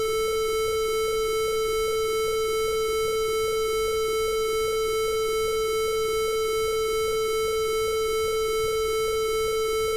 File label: wheel slip